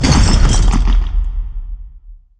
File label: boss umbra release